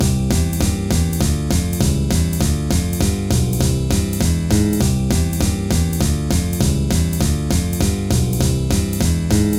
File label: Foxtrot